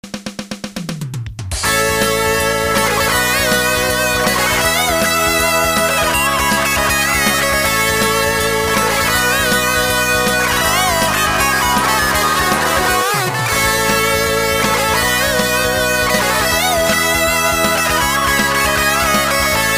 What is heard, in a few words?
Guitar